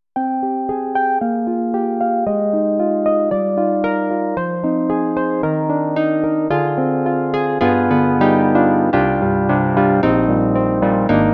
Contemporary Soft Rhodes Wurly Soft; SD-90; EDIROL

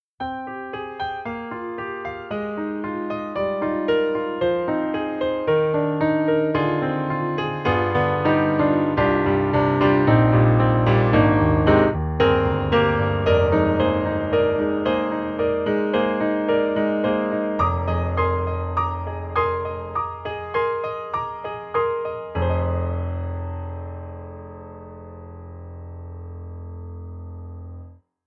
German Grand Close Mic; VI Labs; True Keys

